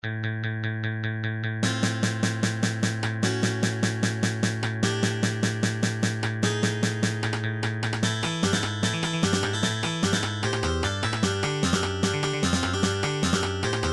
robot master battle; gameboy; mega man

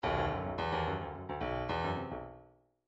bdf midi file grooves funk r funk r

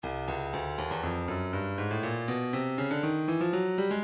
stylus breakbeat midi files 120 bpm; Nightlife B

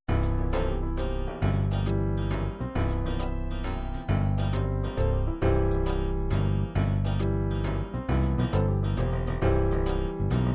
psr9000 waltz, midi

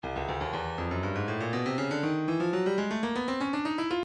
breakbeat midi files 120 121 bpm 120; Stylus

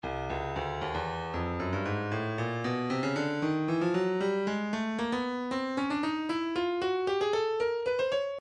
breakbeat 115 bpm; Tojo B; Stylus Breakbeat Midi Files

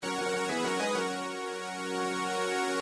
Super Nintendo success fanfare, midi; super nazo puyo 2

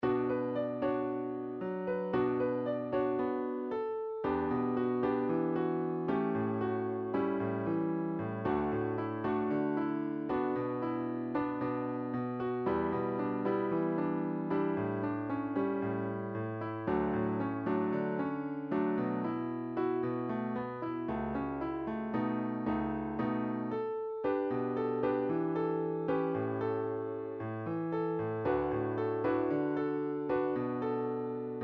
piano mash

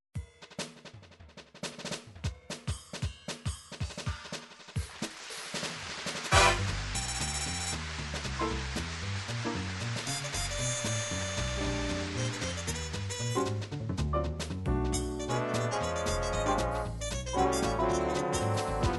jazz, midi

